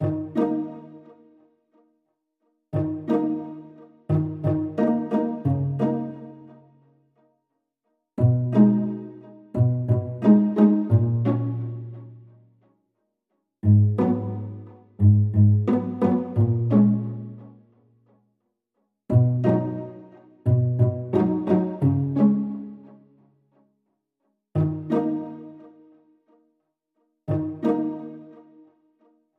Strings Background

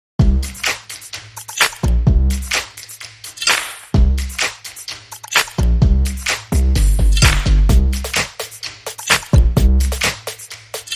Percussion Jam

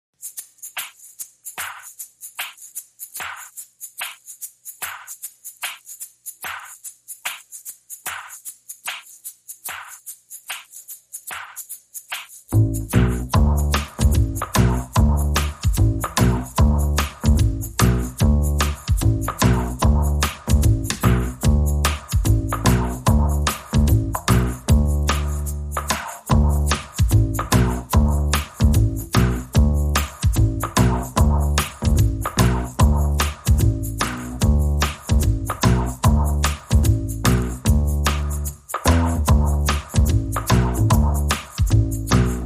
Drums and Bass

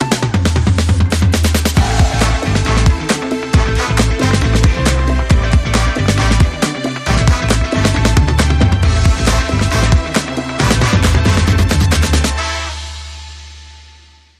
Bumper

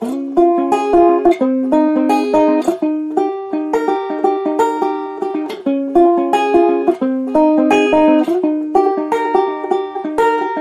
Strolling Around Banjo Only

